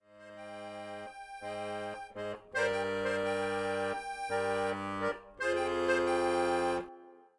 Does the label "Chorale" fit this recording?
No